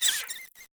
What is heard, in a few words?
critter hit by flare; nightmare criters